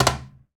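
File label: Cajon Hi Flam, Percussion; Roland BK-5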